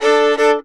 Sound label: FIDDLE FX; Sampleheads; Whole Lotta Country 1 & 2